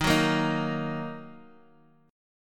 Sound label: Eb Minor Eb Guitar Half Step Down